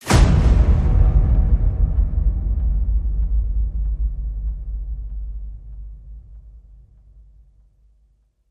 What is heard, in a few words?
Hit Big Revolving Punch